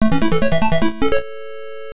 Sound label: Hi Score Reached; Zanac EX; MSX2